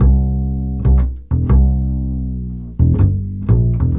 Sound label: 120 upright bass groove